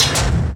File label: DOOR, INDUSTRIAL